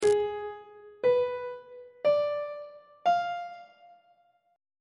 g sharp diminished 7th chord intervals on treble clef